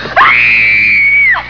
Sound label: Screeem!; SpacewarH40b